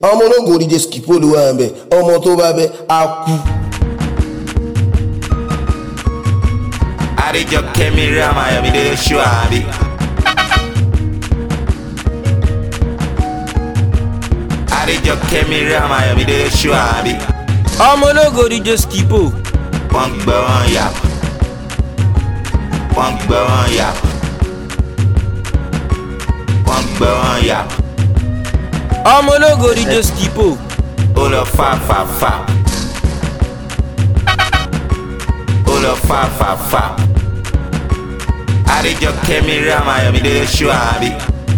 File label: MARA BEAT